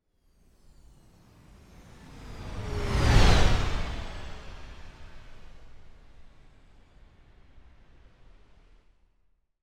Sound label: Space Ship By - Slow Down R to L; Hollywood Edge; sound designer's toolkit v2